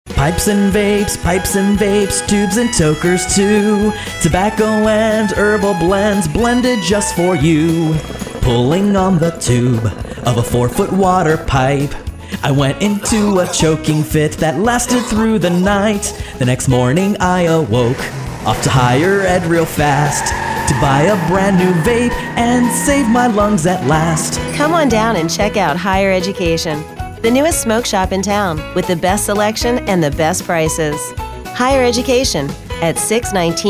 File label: Jingle Bells MALE; Higher Education